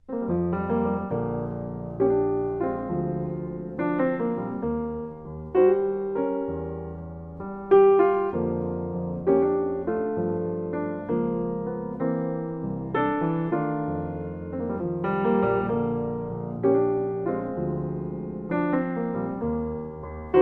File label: Solo Piano